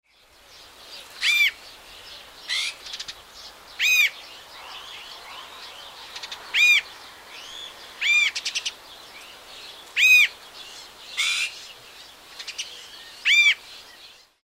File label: iberian magpie